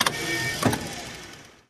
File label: Elect Wheelchair, Electric Wheelchair Start, Run and Stop; Electric Wheelchair Quick Start, Stop